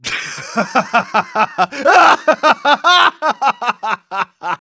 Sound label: Engineer laugh long, de